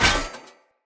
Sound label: Sphere Polearm Medium Impact Metal; Player; Environment